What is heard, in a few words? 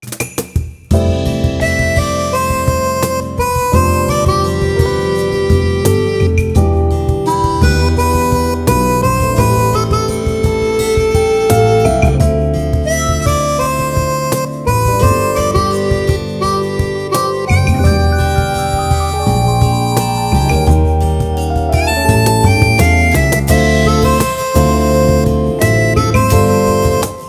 Music Event